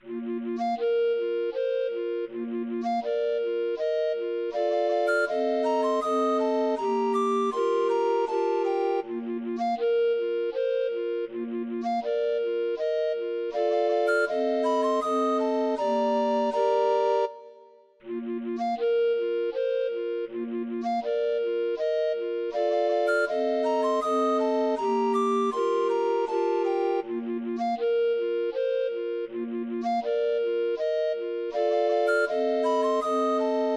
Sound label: mazurka